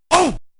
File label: grunt; A grunt that Max used in some the preview footage (see above) for his jump attacks, but as he was given a new voice for the final version